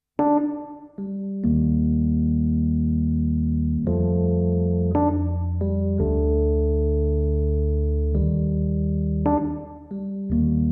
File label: Rhodes